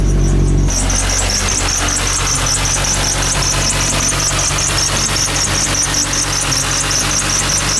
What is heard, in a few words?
belt squeal